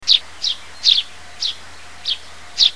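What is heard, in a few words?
sparrow; suzume; toritori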